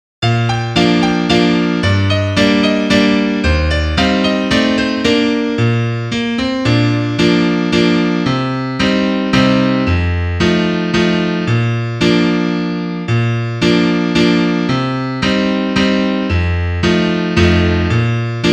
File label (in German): Klavier; kommt ein vogel geflogen